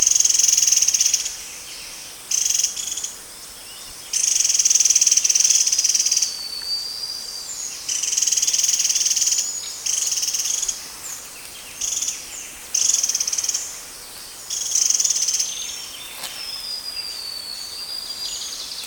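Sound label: Slaty bellied tesia, bird; تسک شکم توسی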